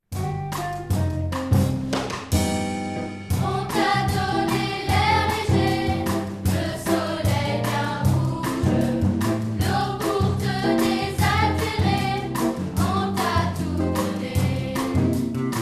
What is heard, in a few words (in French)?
donne donne donne voix polyphonie